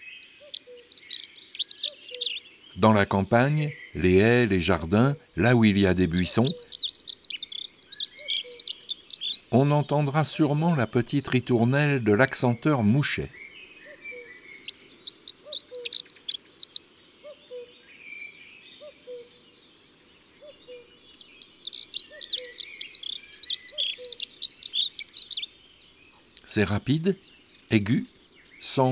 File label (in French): L Accenteur mouchet, chants d oiseaux